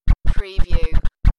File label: Turntable Scratching